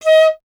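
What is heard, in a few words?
FLUTE PICCOLO D#; Miroslav Vitous - Solo Instruments VOL-2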